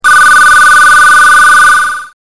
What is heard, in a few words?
TELEPHON